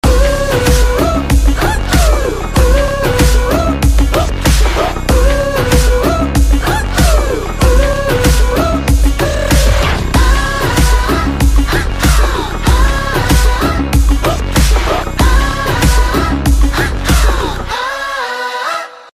epic bgm ringtone; Vettaiyan

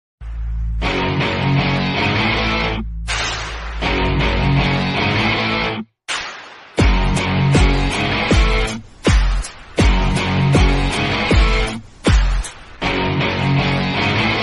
mass bgm ringtone; arjun reddy; telugu tones

